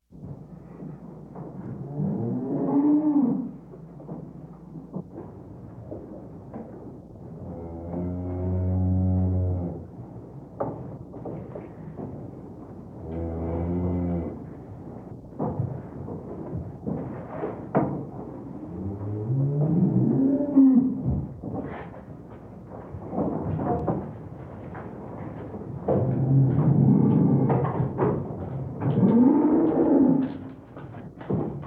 kuhstall, Auf dem Land

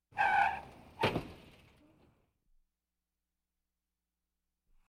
reifenquietschen, stoßstange verbiegt sich; Auto-Zweiraeder

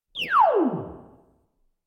photonen, Science Fiction

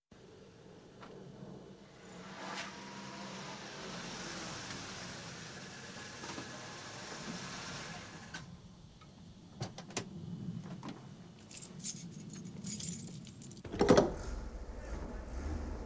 auto explodiert an tankstelle; Katastrophen

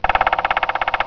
specht

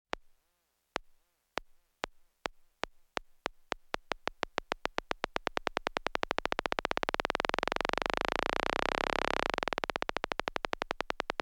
tickender geigerzähler; Katastrophen

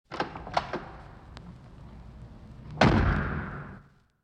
knarrende Tür schließen; Horrorgeraeusche

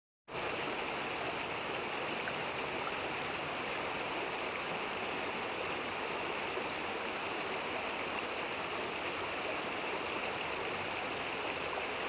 rauschender bach; Auf dem Land

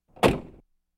autotür schliessen; Geraeusche

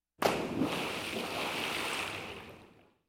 sprung ins wasser; Geraeusche; Am Meer

